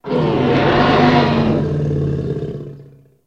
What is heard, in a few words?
Dinosaur Groan Guttu